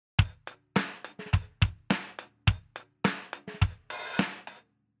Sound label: break beats